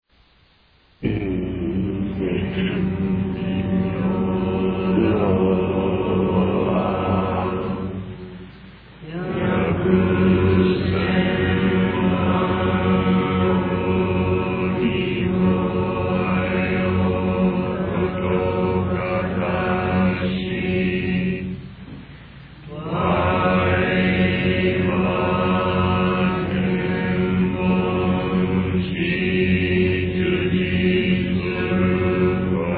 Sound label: chant